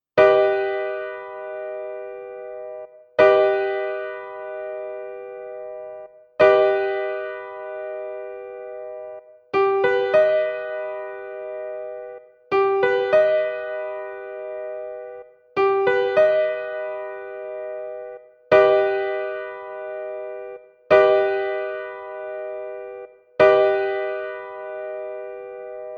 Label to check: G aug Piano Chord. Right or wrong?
Right